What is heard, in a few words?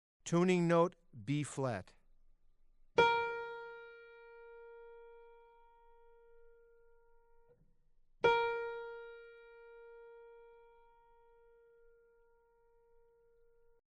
Tuning Notes (Concert Bb); Jerry Bergonzi - Inside Improvisation - Vol. 3 - Jazz Line